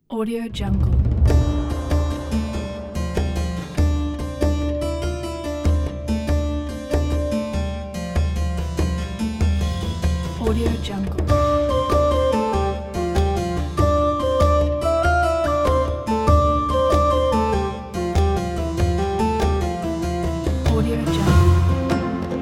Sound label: medieval fantasy island